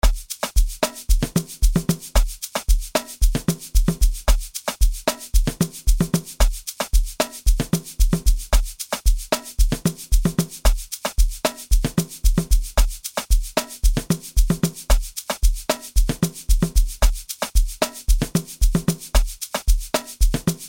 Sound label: Vocal Mix